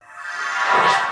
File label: enchant; Battle